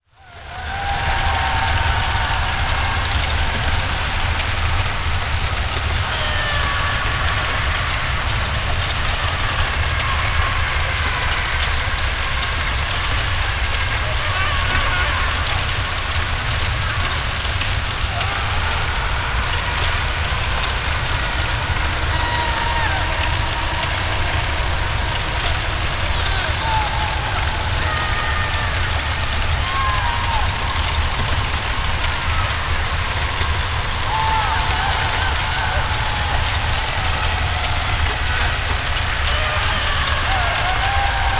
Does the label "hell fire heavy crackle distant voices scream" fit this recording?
Yes